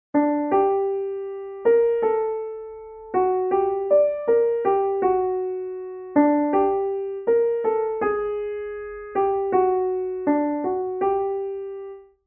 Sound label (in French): theme piano main droite